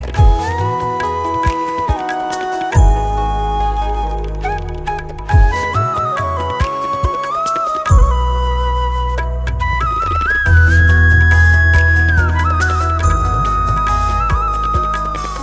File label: Flute; Garuda Gamana Vrishabha Vahana